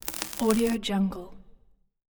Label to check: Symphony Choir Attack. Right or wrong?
Wrong